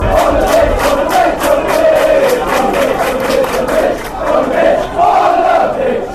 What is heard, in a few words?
pitch fanchants